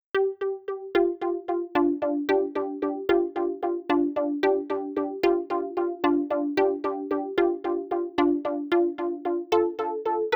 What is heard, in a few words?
drones; ACID